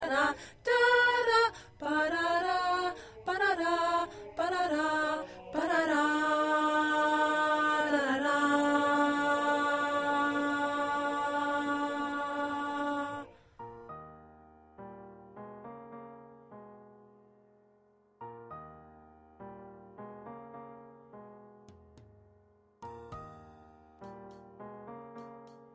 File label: Soprano